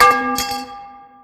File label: Bowl Hitting Ground; Feed The Beast